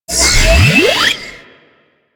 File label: Nullifier Charge; Corpus Nullifier; Corpus Ship Remaster